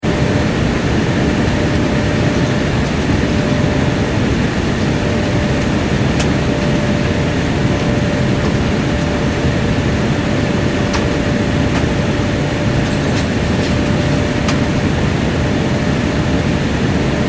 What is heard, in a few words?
transportation aircraft commercial airbus a340 interior, In-Flight Ambience; Airplanes; Vehicles